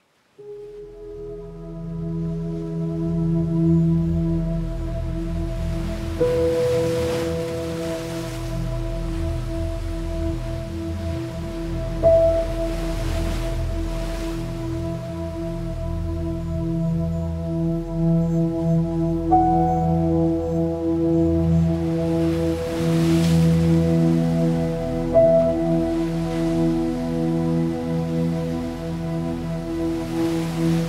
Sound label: baby lullaby baby music baby sleep music calm piano baby music